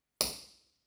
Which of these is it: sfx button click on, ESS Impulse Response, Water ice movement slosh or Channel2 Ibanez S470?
ESS Impulse Response